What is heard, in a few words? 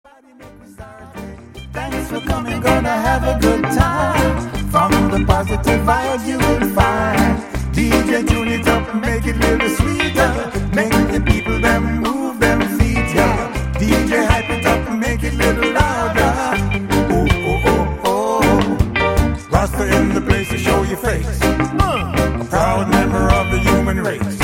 Reggae Music